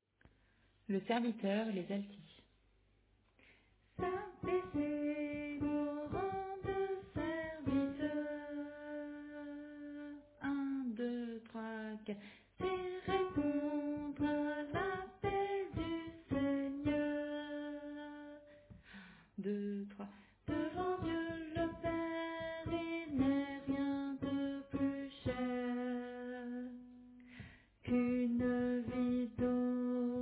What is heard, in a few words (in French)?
Alto